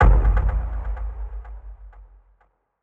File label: FX Movie Impact